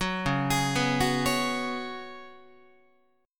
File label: C sharp 7th Suspended 4th C sharp, Guitar; C#7sus4; arpeggiated; tuning=4A,4E,5A,5D,5G#,6C#; guitar chord